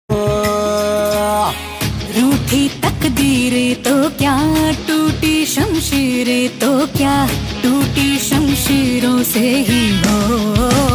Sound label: female version; sanju movie